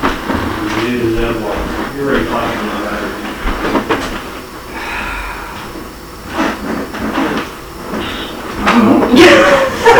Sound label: Living Room Unexplained Breath AMPLIFIED